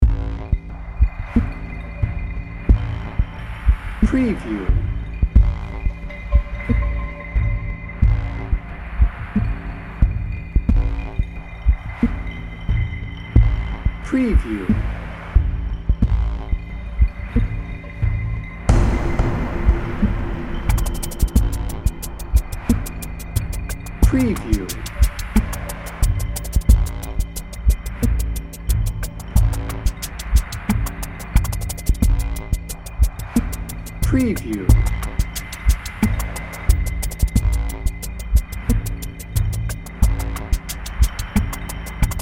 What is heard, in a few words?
night stalker loop